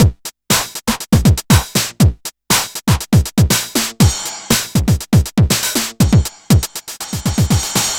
909 OVERDRV; Wizoo - Platinum 24 Electronic; DEMO KIT

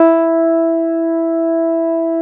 MELO BAS; Synclavier Sampler Library; KEYS AND GUITARS

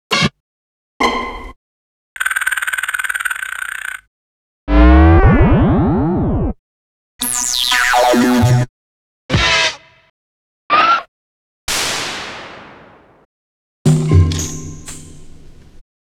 breaks, Jungle; Zero-G Jungle Frenzy 2

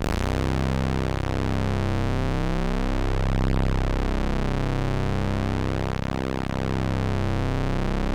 ARP Odyssey C2, PORTAMENTO; Solo-Synths; Giga Samples Collection

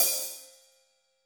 HH, Drums; Bob Clearmountain Drums I

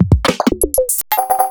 Roland MV8800 DRM KIT; V-HOP KIT

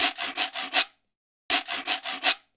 Quiro, Latin Percussion; Sonic Foundry (Sony Creative Software)

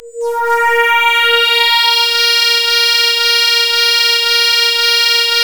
A#, Synclav FM; Big Fish Audio; SPACE1

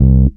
HEY, Roots Disco; USB Soundscan vol.32; AKAI

